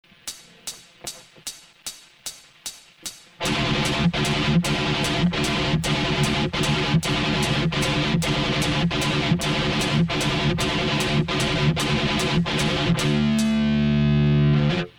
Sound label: thrash mix